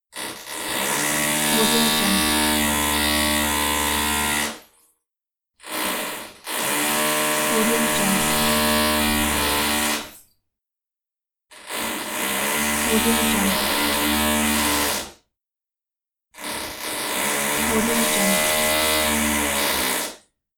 neighbour drilling

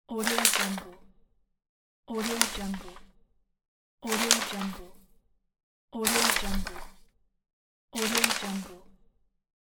newspaper pages flipping

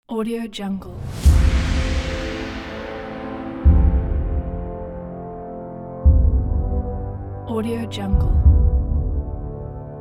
cinematic epic ident

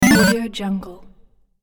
8bit quick asset

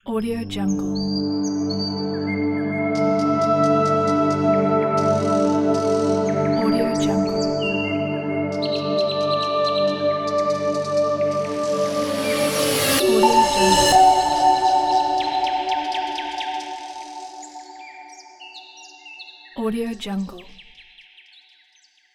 nature birds pad ident

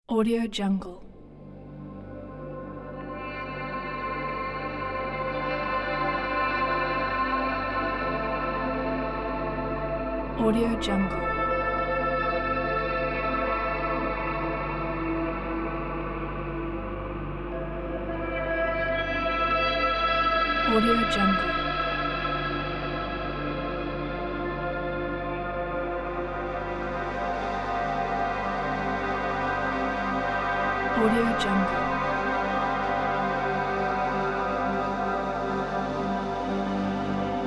intimidating tundra; elephantandcastle

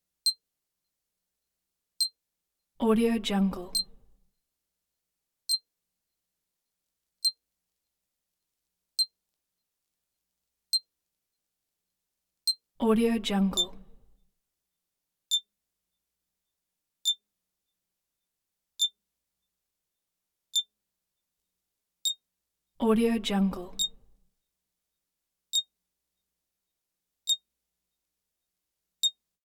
synth fx small high pitch synth tones beeps

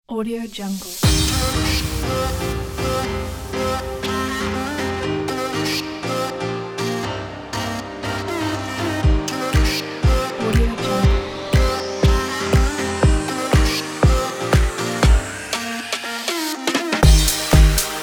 summer fashion house

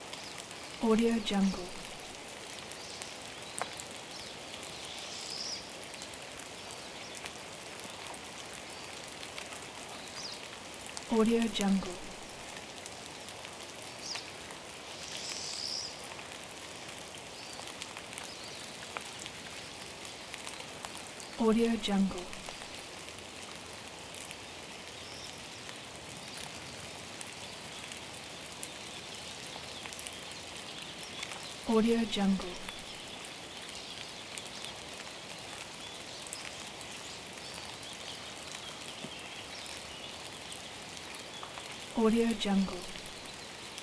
forest freezing rain

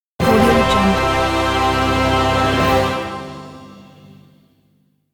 ta da orchestra fanfare

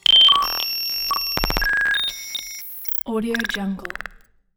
screens data text calculations; sinematic 2; soundmorph

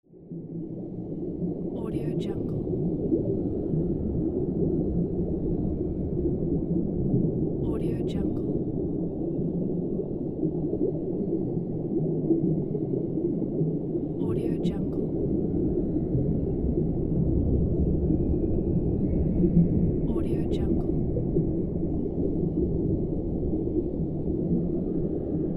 under water

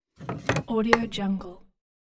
put and take object from the table

